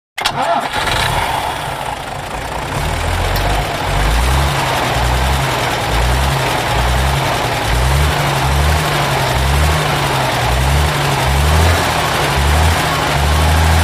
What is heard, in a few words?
Bus Start Away Coach S